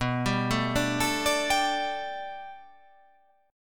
B Minor 7th Sharp 5th B, chord

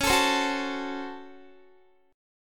C sharp Major 7th Suspended 4th Sharp 5th, Guitar; C#M7sus4#5; strummed

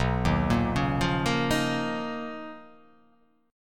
B Major Sharp 11th

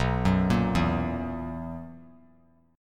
B Major 7th Suspended 4th B; BM7sus4; arpeggiated; 11,13,13,14

